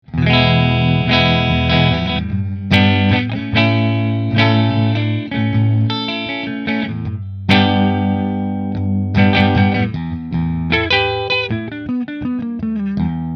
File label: Nola JB14 Red Middle Fender